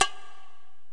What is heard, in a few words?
Korg x5, Drums